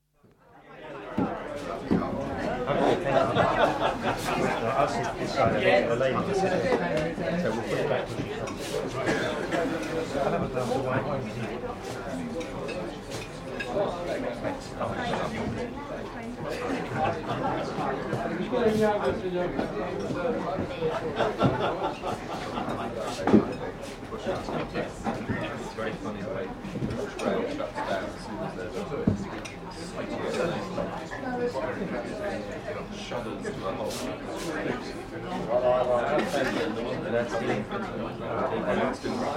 Small Restaurant, atmosphere in moderately busy London West End Italian restaurant (slight vent hum); BBC